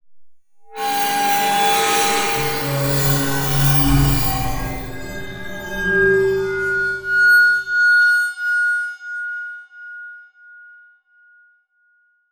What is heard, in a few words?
bow frag